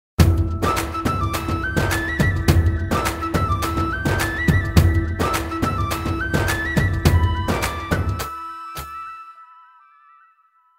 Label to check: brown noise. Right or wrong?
Wrong